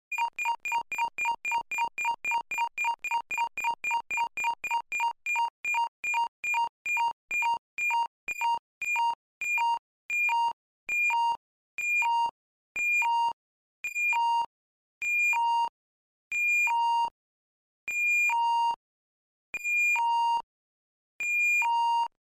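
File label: medical monitor, slowing down and death; Звуки обратного отсчета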